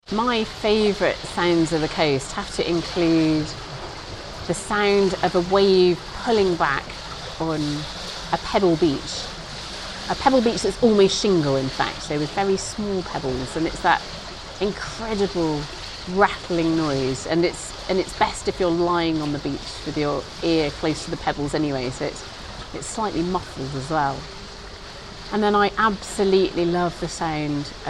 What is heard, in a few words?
favourite coastal sounds; alice roberts